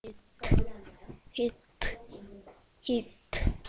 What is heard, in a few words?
hit